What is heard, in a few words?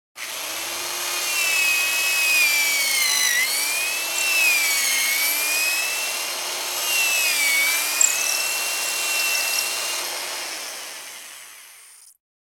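hand drill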